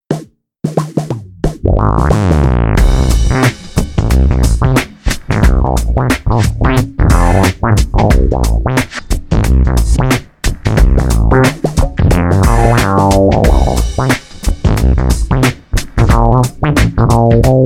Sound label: me-20b patch; ME-20B Bank 8, No. 3; '70s funk sound using synth bass.